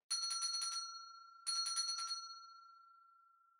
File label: Winning Bell Far Gentle